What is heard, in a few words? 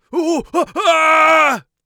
on fire, Mage Voice Pack